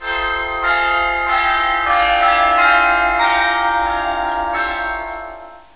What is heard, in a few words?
bell